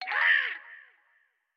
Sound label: Skeleton Wind Up